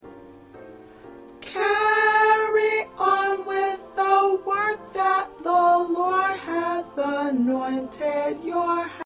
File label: Alto